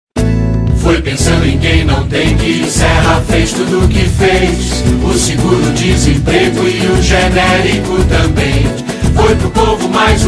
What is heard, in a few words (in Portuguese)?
Samba; Samba do Serra; jingles politicos